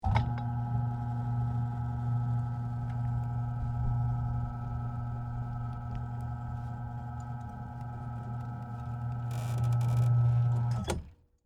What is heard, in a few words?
Electric Gate Open; Gates; Doors; BlastWave